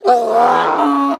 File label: Pack Mule Attack; Exporters